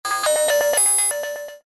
science fiction robot beeps notification; zapsplat

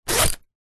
bag rucksack fabric empty zipper; foley; zapsplat

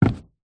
footstep single wood decking boot; foley; zapsplat

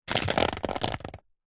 water drips short sudden onto umbrella; nature

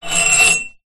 metal scrape object along metal pole; foley

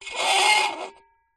Metal Dry Ice Handle; sound spark

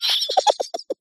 cartoon laugh high pitched

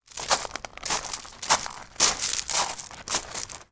foley footsteps stones beach

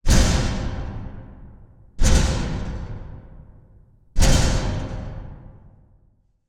impact metal x3 hard clangs

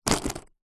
sport skateboard mini board plastic set down hard; zapsplat

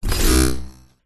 wired power up; futurnetic

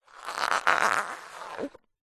foley balloon small inflated rub handle creak; zapsplat

